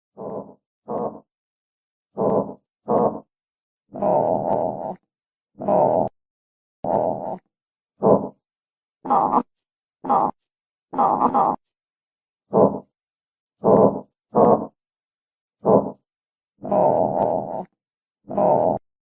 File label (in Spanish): SONIDOS del ARMADILLO; el armadillo solidario